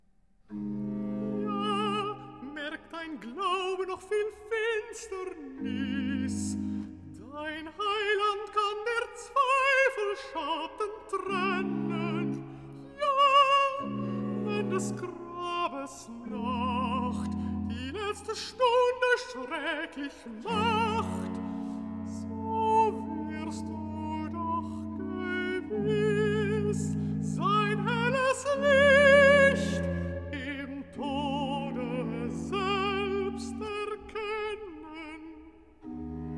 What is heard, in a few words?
Recitativo (Alto); BWV 83; Erfreute Zeit Im Neuen Bunde; Oui, Si Ta Foi Perçoit; KantateMariaeReinigung; BrilliantClassics